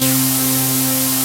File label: TECHNA STABS, TECHNO; Pro-Rec - Synthsonic; Z1 TECHNO